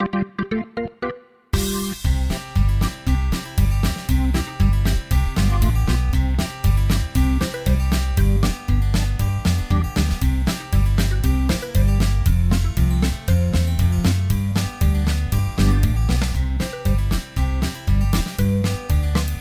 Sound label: head shoulders knees and toes ringtone